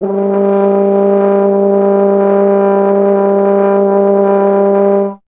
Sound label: F. Horn; Miroslav Vitous; Solo Instruments VOL-2